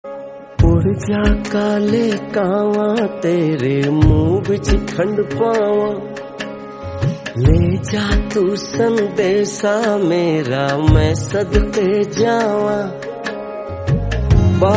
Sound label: Hindi Song Ringtone